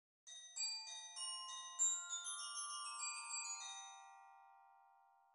Metallophon; Instrumentenkunde

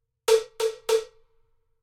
Gehoer Rhythmus; Gehoerbildung

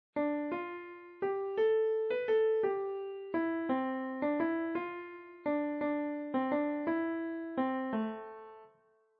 Gehoer Terzen; Gehoerbildung

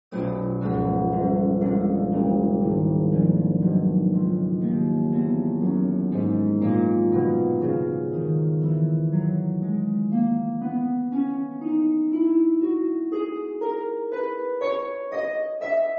Harfe Stimmung; Instrumentenkunde

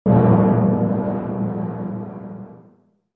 Pauke; Instrumentenkunde

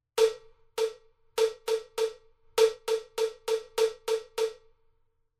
Gehoer Rhythmus Beisp; Gehoerbildung

